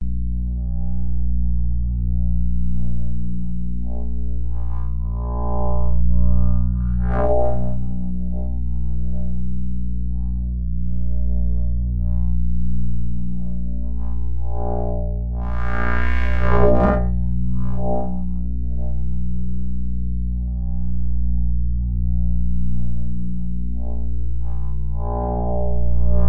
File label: GURGLER SETTING 1; Studies in MASS